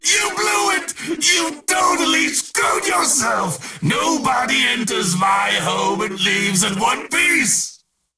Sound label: spawn; death wish